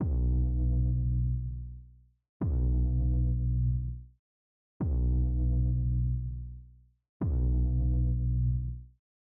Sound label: Bass Idea; Sample Growing Stream 002